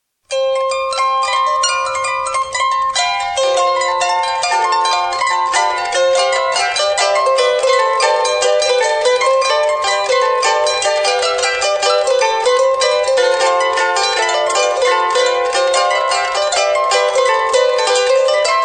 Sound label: valiha